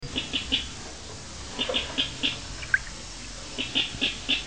The sounds of birds

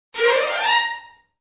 String, Ringtones; Original Ringtones Sony Ericsson T707

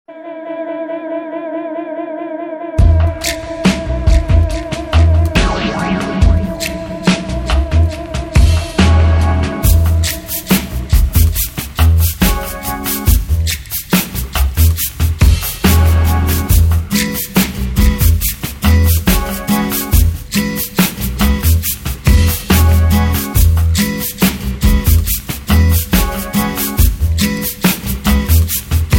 Ambient Chillout; Ringtones

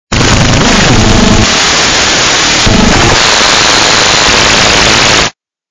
static

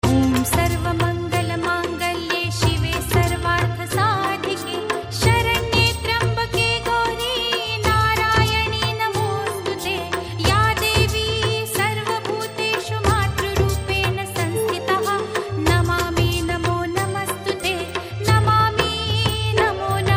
devotional; Navratri Special

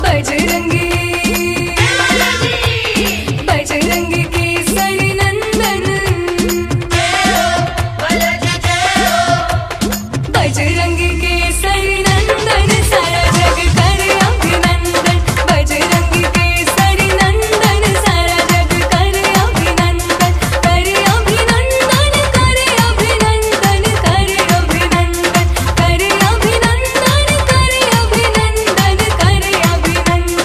Devotional Ringtones; Jai Hanuman

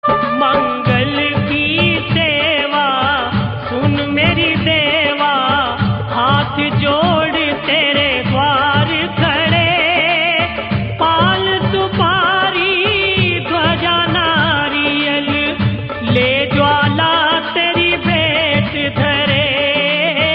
aarti maa bhadrakali; Navratri Special

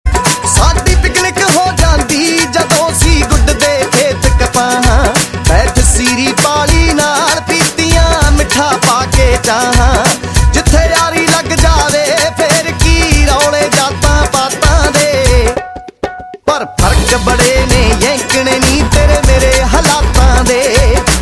Punjabi Bhangra Tones; Ringtones

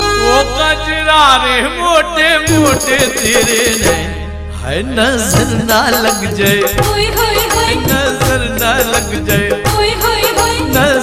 krishna ringtone; Jai Shri Krishna; Devotional Ringtones

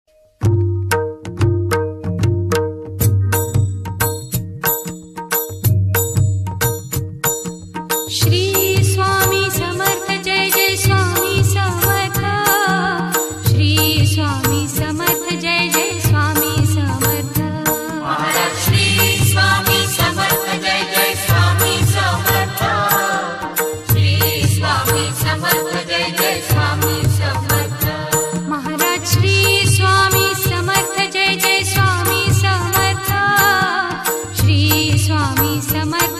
Bhajan Song Ringtones; Devotional Ringtones

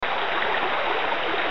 water; Sound Effects Ringtones (Water Weather)

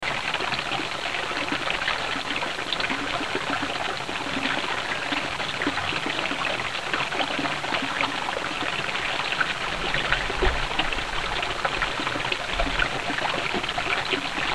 water; Sound Effects Ringtones (Water Weather)

